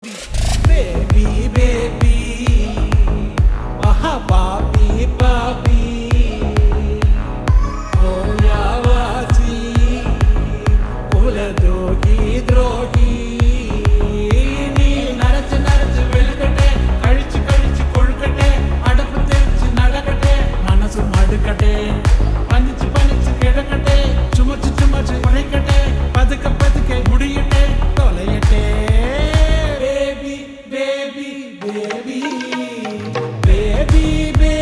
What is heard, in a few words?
Slowed Reverb; painkili